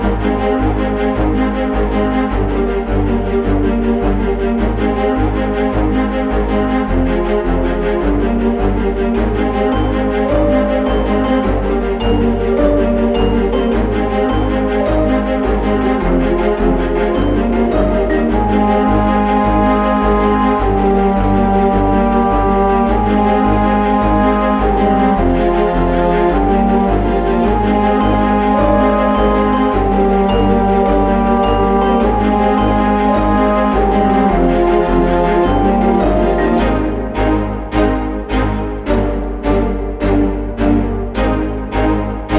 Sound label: New Bells; Music; WinterTales